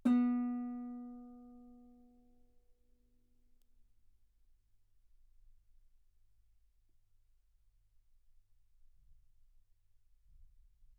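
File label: Guitar ff B string B3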